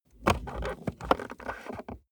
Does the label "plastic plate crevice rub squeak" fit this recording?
Yes